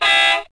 Toy Honk; Wacky; Scratch

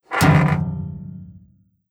piano fix; lucius

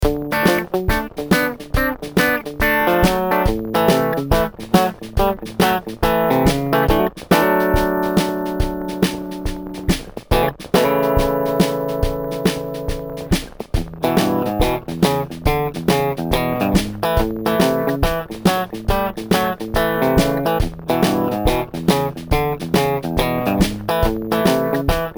Country Song; Blackgate; macOS; Windows

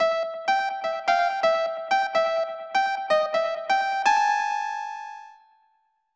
Piano; Treasure Hunter G; SNES; Piano Skill Level 1

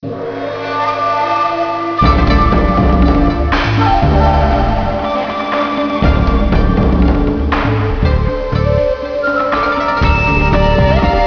Menu; Cars 2 2011; NDS

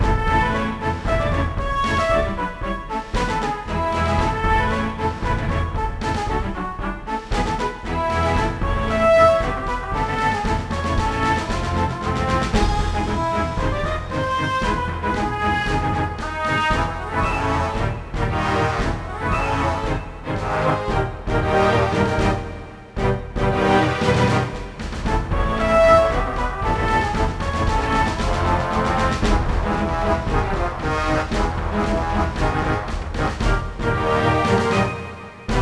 Duel Music Good; sid meier s pirates 2004 pc

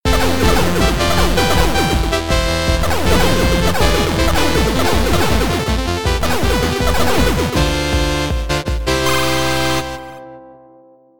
8BIT Space

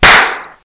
Slap; Talking Tom Cat

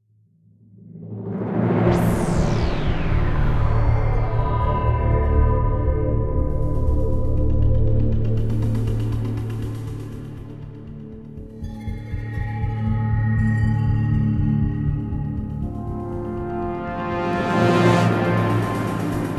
Music Template - Tension; mass effect 2

